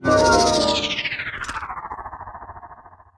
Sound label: Transform Human; Transform into Human